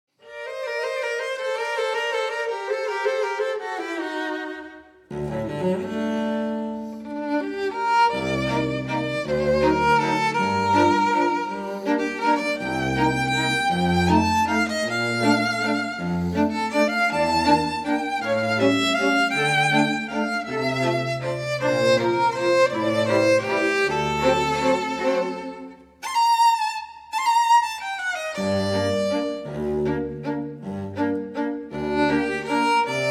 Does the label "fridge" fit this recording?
No